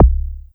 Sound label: HOUSE BD; drum; BASSDRUMS